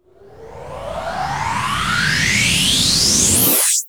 FX Sweep Up

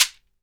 SHEKERE SI, Synclavier Percussion; ILIO

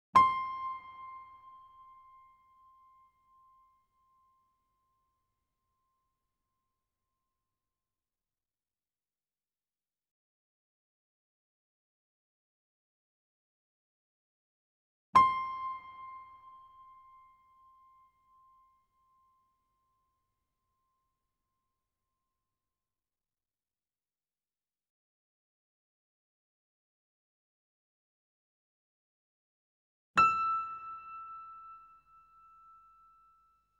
C6 E6 A6 Grand Piano & Effects; Sound Sampling Collection VOL-3; Grand Piano 88

